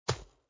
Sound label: kick; chicken